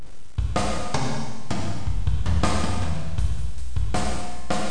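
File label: drum reverb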